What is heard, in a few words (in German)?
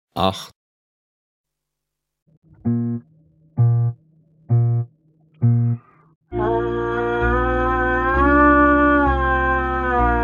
Klang, Hornschule